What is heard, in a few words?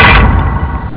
air pomp; Amiga Soundtracker Sample Packs